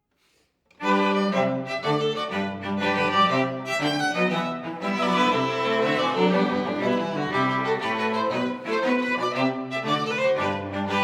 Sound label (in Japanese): ジグ; 東方紅楼夢9